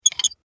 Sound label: Squeak Metal; Texture; Squeaks; Sound Effects:Objects